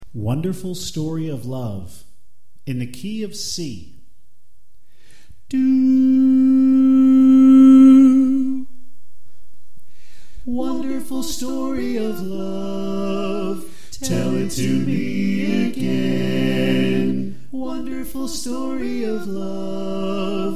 Soprano Left

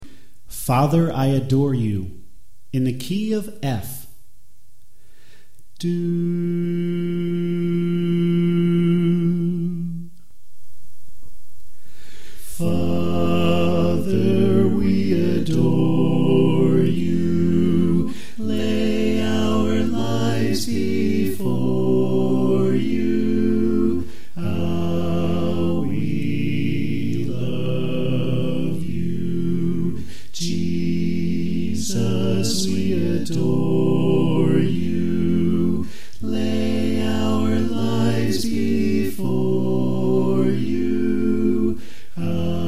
Bass Left

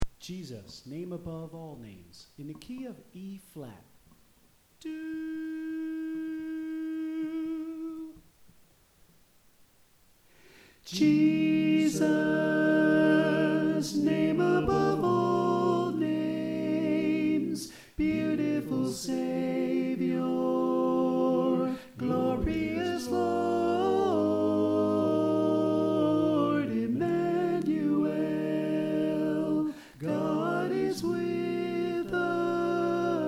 Alto

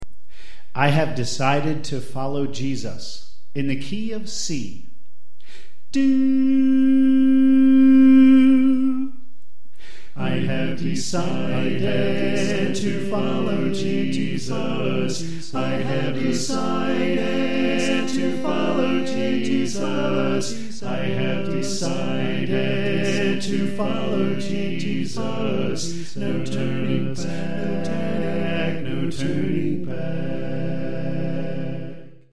Bass Left; I Have Decided To Follow Jesus

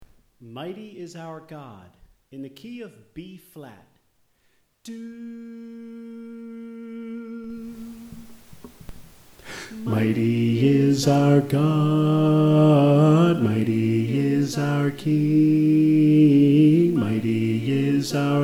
Bass

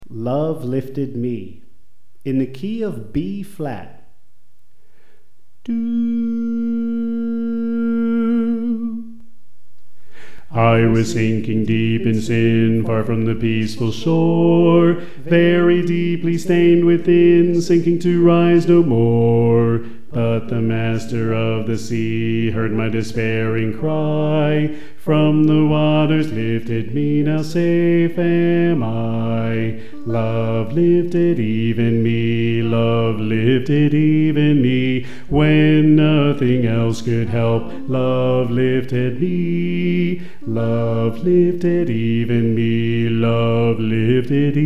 Bass

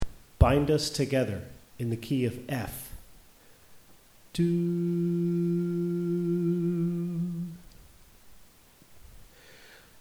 Soprano

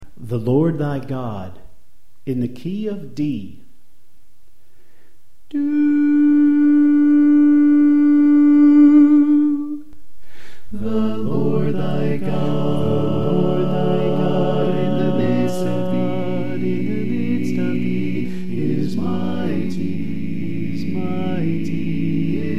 Echo Left

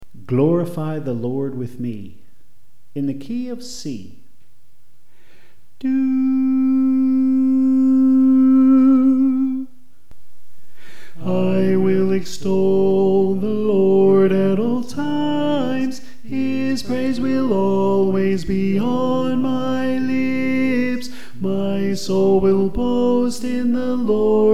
Tenor

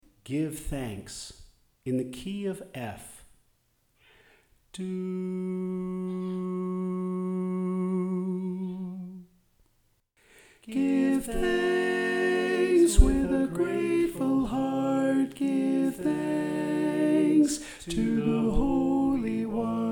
Alto

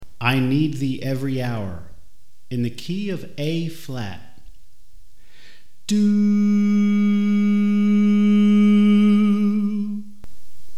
Soprano; I Need Thee Every Hour

